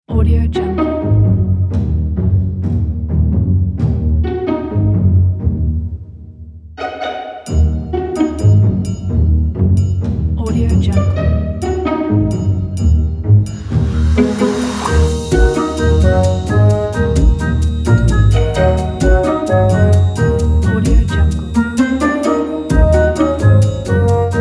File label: funny